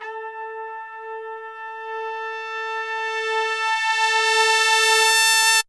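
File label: brass crescendo; Zero-G; Phantom Horns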